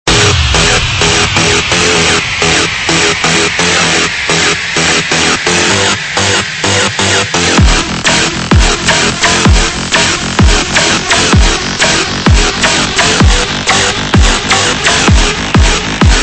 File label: Bass House; Angry Parrot; Serum Presets